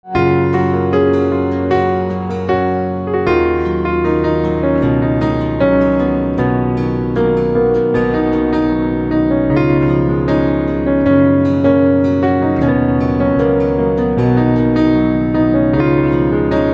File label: Piano